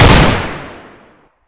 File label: ultimax; garrysmod